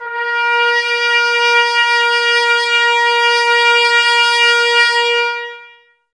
BRS, Woodwind Brass Ensemble; Miroslav Vitous; Brass Finale

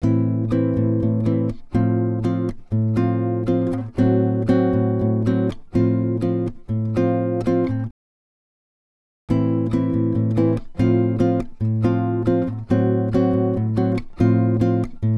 Salsa Picante; Masterbits; World Zone VOL-3

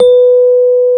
electric pianos; Wizoo Electric Pianos

